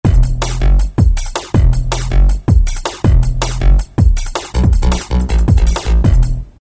bts, E-mu PK-6; Statik One

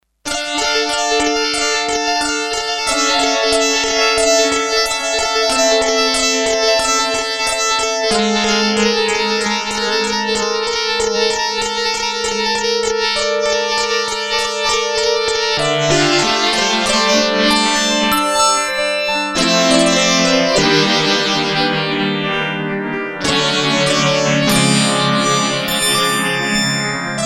Sequential Circuits Six-Trak; 09 Miridium; Factory Programs

